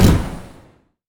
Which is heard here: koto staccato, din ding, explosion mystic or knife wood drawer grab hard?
explosion mystic